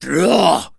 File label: zombie attack, claw attack; zombie plague